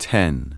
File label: VOX GENERAL; Megabass Remix! 2